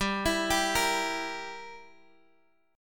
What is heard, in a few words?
G Minor 6th, Guitar Open F; Gm6; arpeggiated; x,14,16,14,13,x